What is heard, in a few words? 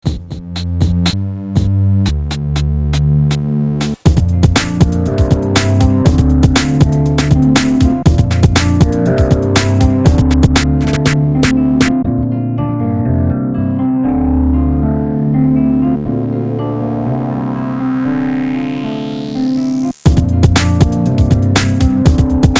Z beat